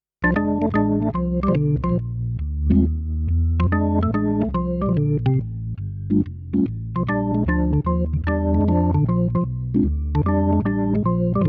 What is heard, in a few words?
Blues 60s